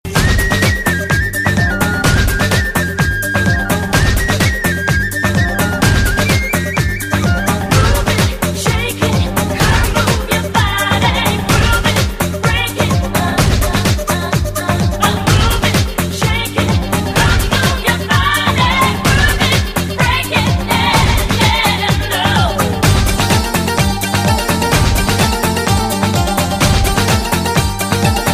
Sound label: Coolest Fluite